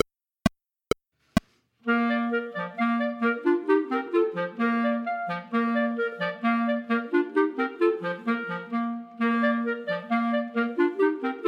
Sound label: Ecossaise, Chamber Music for 3 Clarinets minus 2nd part; Hummel